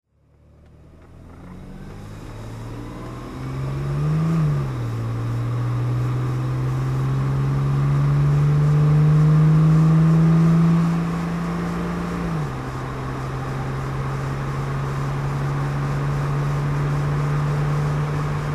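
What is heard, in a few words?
MGC GT automatic driving, engine sound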